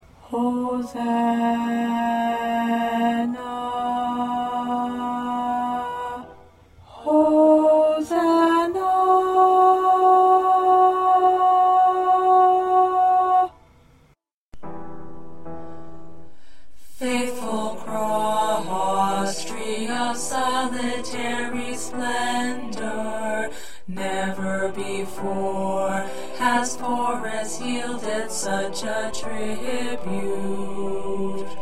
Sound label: 2nd Tenor